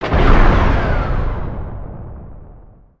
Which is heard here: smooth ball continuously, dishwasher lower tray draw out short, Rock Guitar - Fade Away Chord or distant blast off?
distant blast off